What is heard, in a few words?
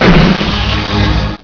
pickup